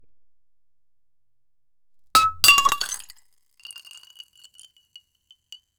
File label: pour cold prosecco in a; breakage